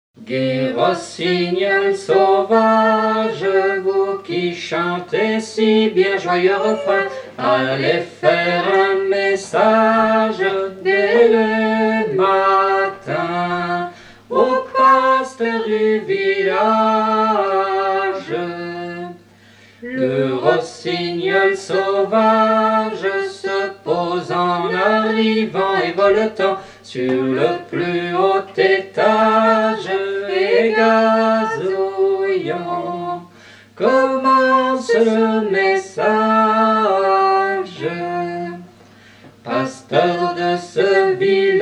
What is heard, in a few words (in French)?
gai rossignol, noel, chants